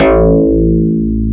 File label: XFM BS, FM Syn Bass, Synth Bass